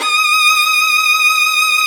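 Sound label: VIOLIN VIBRATO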